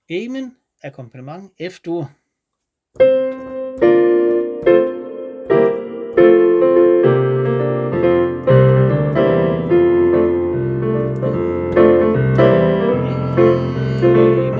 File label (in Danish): amen f og g akkompagnement; koret